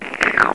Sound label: slime licking; tritus